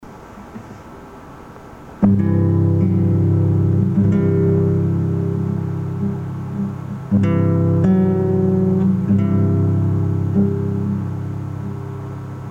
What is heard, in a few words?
Guitar